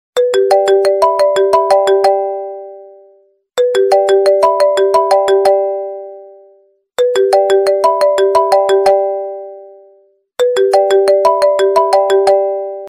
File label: iphone ringtone